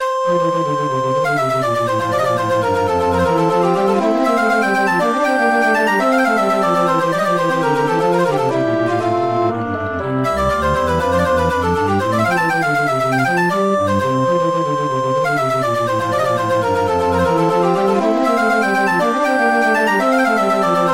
organ

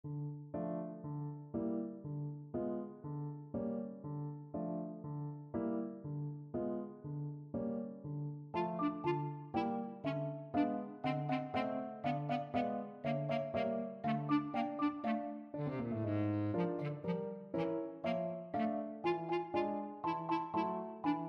bass clarinet; tchaikovksy sugar plum

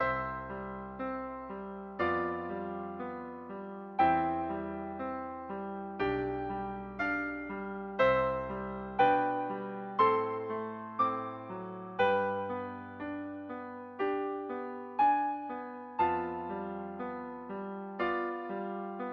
duet, piano duet

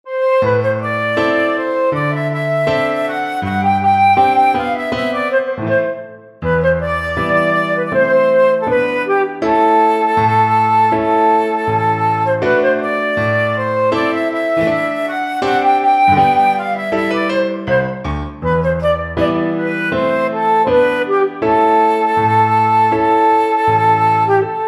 romanian folkdance, flute

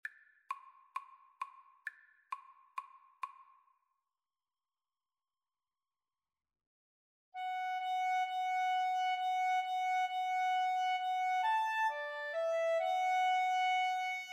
Guitar Clarinet Duet

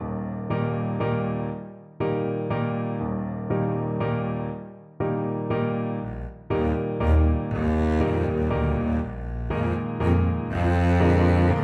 saint saens elephant full, double bass

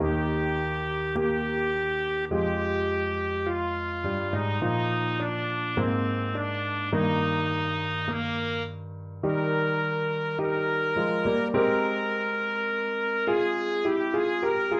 Beethoven op30 II trumpet

